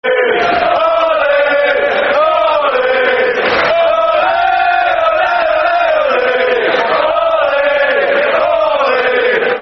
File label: Football Chant (Soccer Song), Man United; Ole Ole Ole Solskjaer